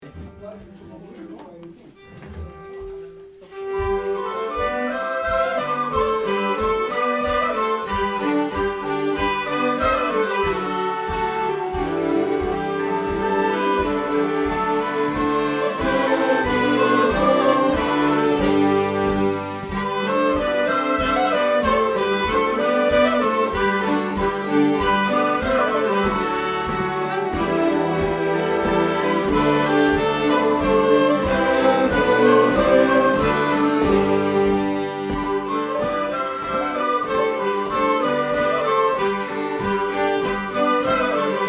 Vevlirepolskan

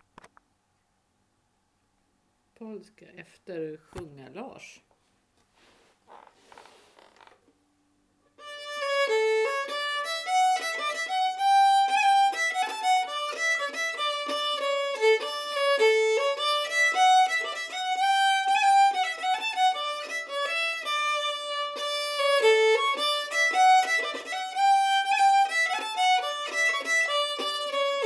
Polska; efter Sjungar Lars Larsson från Lima

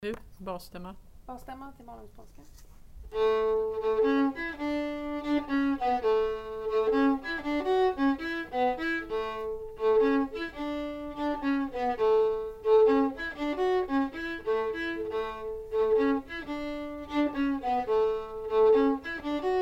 Basstämma till malungspolska

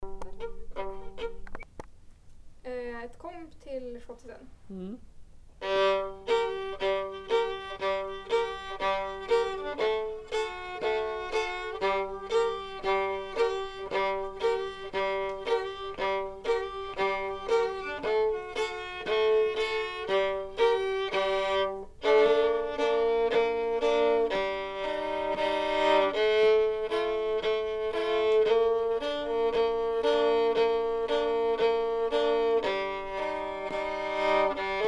komp till schottis

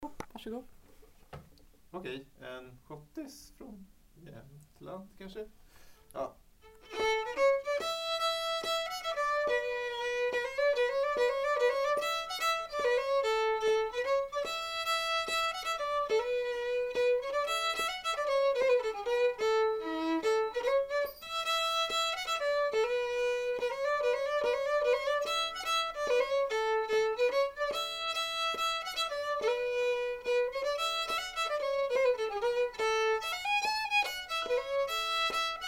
Schottis från Jämtland

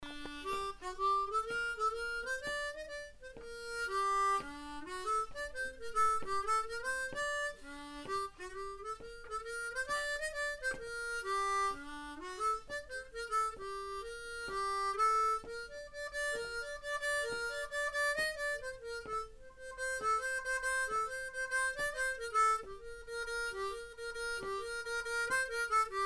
schottis

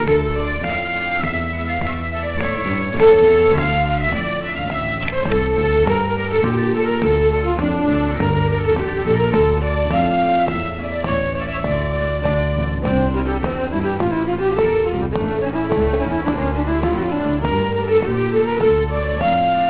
Pajalapolkan

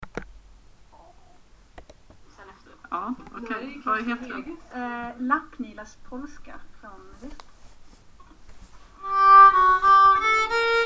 polska

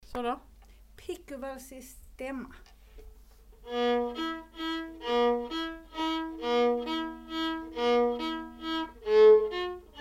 Komp Pikkuvalsii

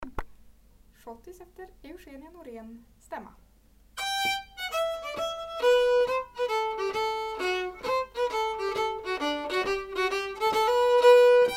Stämma till schottis; Eugenia Morén

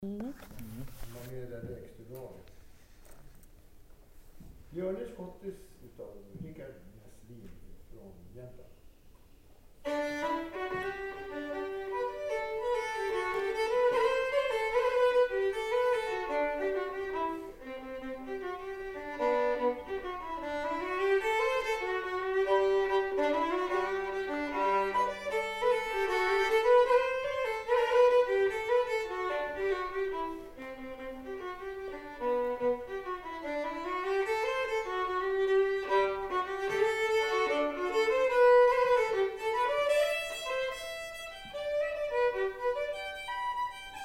Björnes Schottis; av Rikard Näslin

